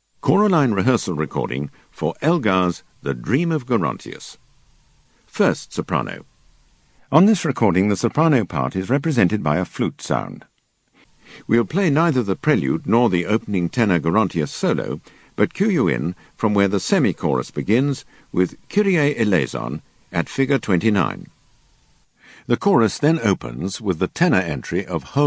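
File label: Soprano; Elgar Dream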